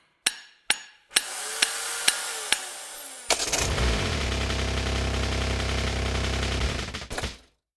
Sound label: car repaired; factorio 2.0.55